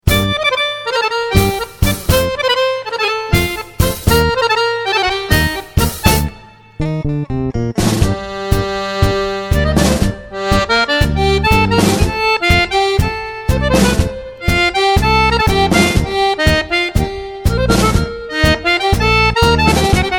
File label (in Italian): tango spagnolo